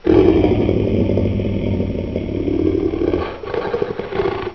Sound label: wolf growl; wolfaris; wolfenkynde